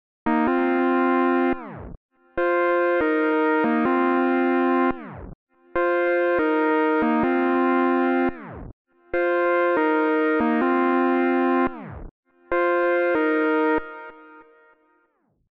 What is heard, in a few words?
142 BPM F Min Saw, melody loop stem; Loop Stems; Cymatics; 2022 Melody Collection; Wavy; Filthy